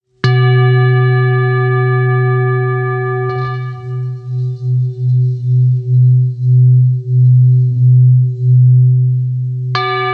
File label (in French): bol tibetain